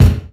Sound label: 00s prominent steel kick drum sample f key; Duration 0:01; Samplerate 44100 Hz; Channels Mono